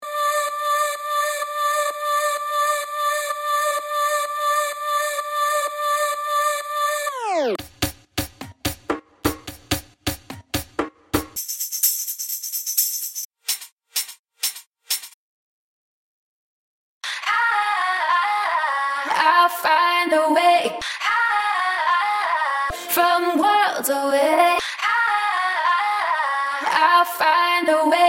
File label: progressive house; sample pack